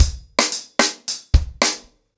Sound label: 110BPM, ACOUSTIC DRUMS